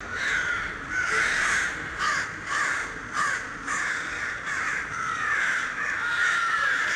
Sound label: several crows cawing outdoors; birds